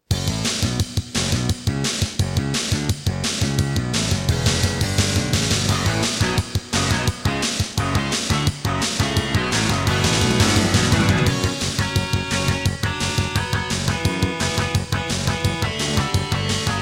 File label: Intro, Dreamblaster S2; Serdaco; Duke Nukem 3D